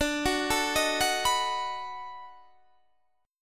B 7th D; B7/D; arpeggiated

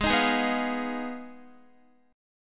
Ab Minor 6th, Guitar; Abm6; strummed; x,11,13,13,11,12

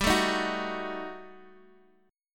Eb Diminished G, guitar; Ebdim/G strummed; JGuitar

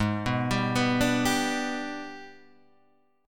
Ab Minor Major 7th Flat 5th, down; AbmM7b5; arpeggiated

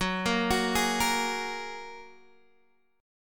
F sharp Major Add 9th F sharp, Guitar Open D; chordshape; down

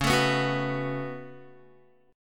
Db 7th Suspended 4th Sharp 5th Db down Guitar

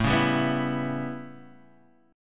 A 9th, down, Guitar, Drop D

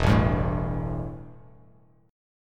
E Minor Double Flat 5th E, Bass Standard

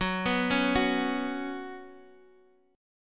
F sharp Suspended 4th, Guitar, Open G; down

